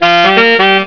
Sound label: SAXES